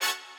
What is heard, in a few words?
Hit